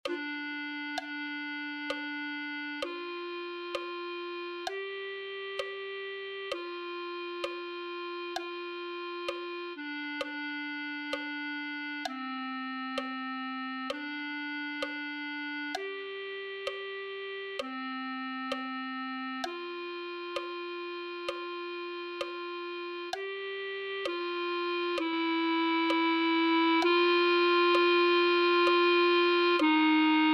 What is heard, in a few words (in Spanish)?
clarinete